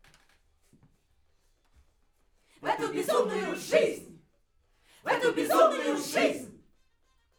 Backs; SCHOEPS

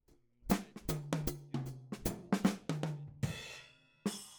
Hat

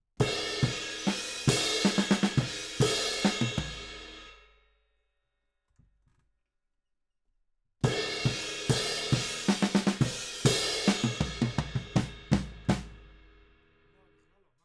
Ride

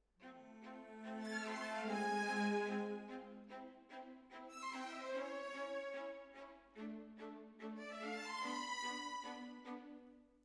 Decca, Jazz for piano

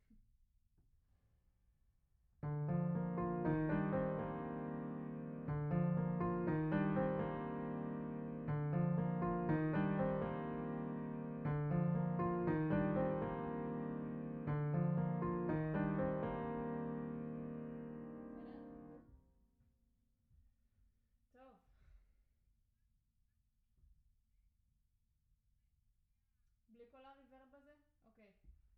Piano Mono

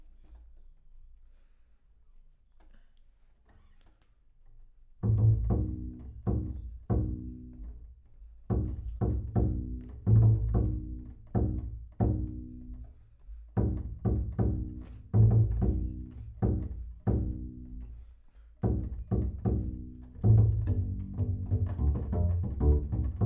Bass Room

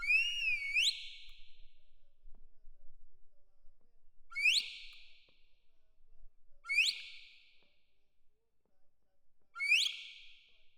Perc Close

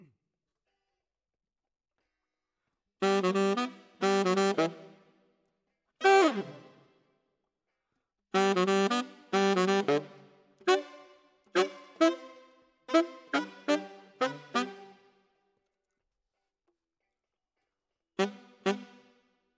Tenor, RNR for piano

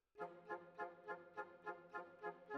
Flute

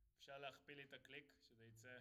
Tom

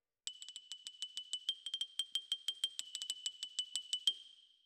Xylo Close

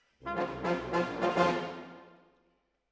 Horns Over

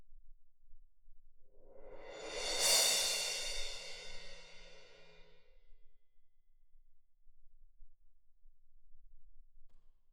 Perc

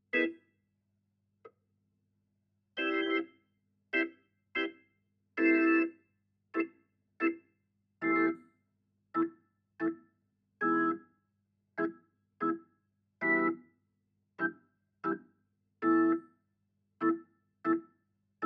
Hammond

